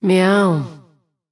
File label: Respawn Nonverbal; Nidalee